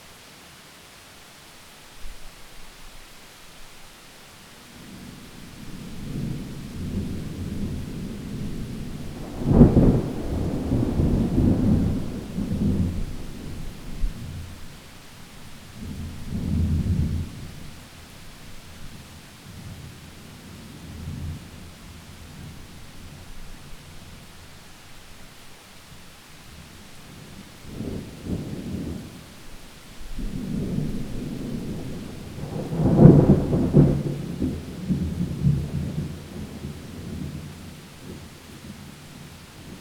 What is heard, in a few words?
thunderstorm inside; OneShot Fading Memory